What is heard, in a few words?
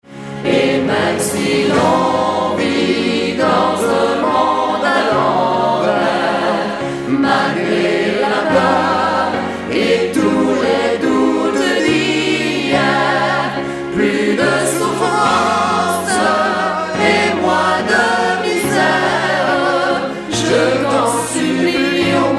La priere SATB Duo